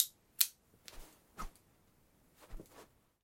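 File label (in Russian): звук складного ножичка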